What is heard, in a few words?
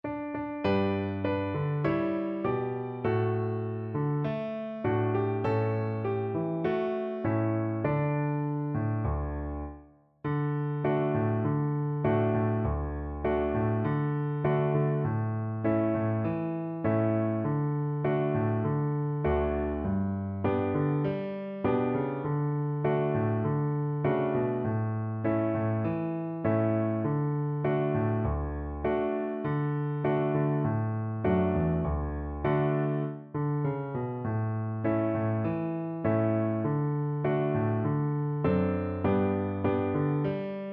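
recorder karaoke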